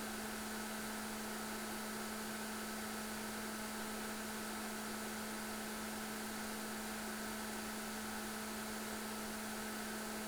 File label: 13000rpm, BDR-S11J-X